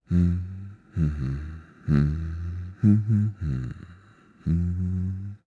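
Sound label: Vox Hum, Japanese; voices; heroes; Lusikiel; kingsraid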